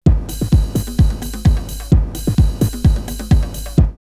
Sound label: Rave drumloops 129; X-Static Goldmine; 90 s Sample CDs